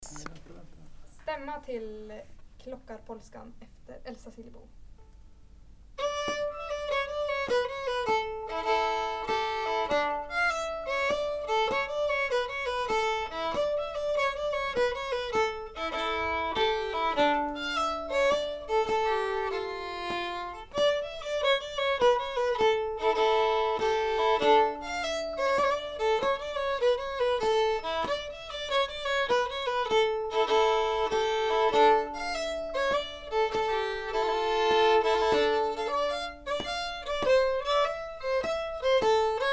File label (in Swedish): Stämma Klockarpolska